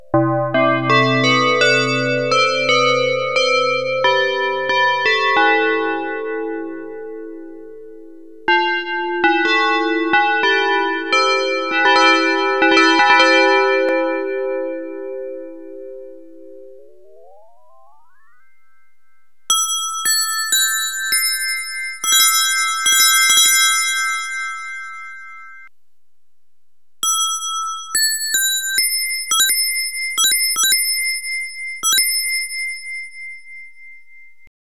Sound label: fm7 tubular bells